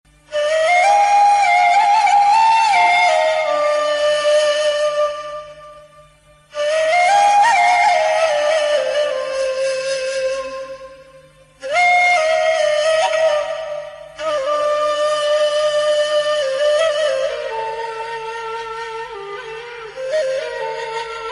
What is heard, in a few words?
flute sad ringtone